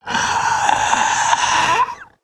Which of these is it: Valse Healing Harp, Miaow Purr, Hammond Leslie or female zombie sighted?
female zombie sighted